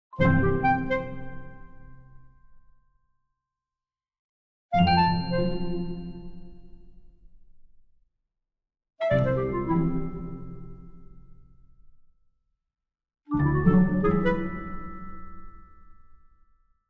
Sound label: Orchestral Jingle Positive